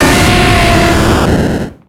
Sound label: typhlosion; cries